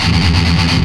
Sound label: Riff, Industrial; Nasty